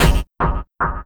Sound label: Warsaw Hit, kit; Sonic Foundry (Sony Creative Software); Esoterik Beatz